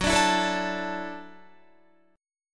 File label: F sharp Minor 7th Sharp 5th F sharp Guitar; F#m7#5 strummed; 14,17,14,14,14,16